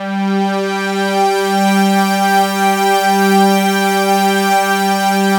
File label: analog swell; Sound Gallery - Charged